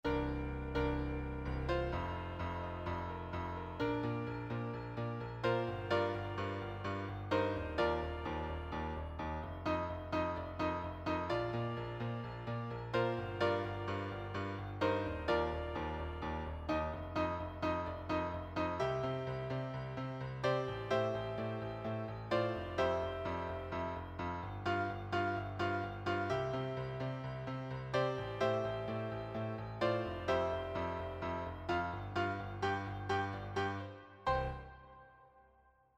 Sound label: Male Accomp